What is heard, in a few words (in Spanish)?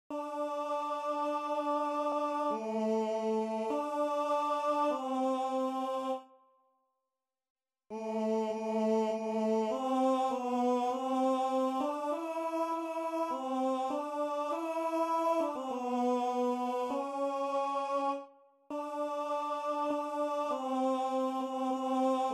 una hora tenor